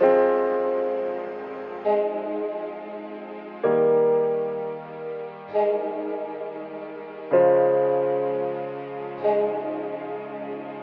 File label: piano, Sad